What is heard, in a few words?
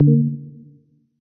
section fail; YoRHa